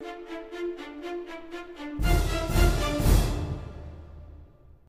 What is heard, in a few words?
Stinger; Spider-Man 2